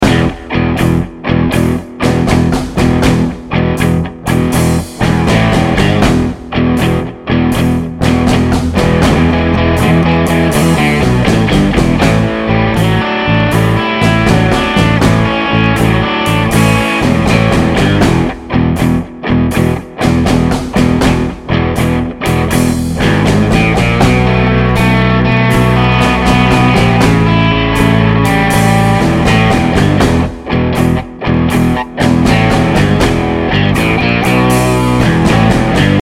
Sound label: Rock n Roll